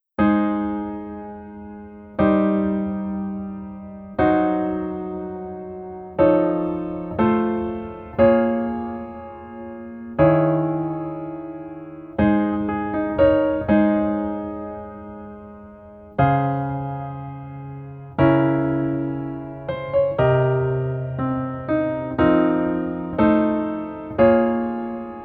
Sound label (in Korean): Piano ver 여자키